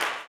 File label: CLAP, blues & soul; ILIO; Vocal Planet